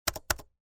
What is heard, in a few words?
Technology Computer Laptop Keyboard Pushing Space Bar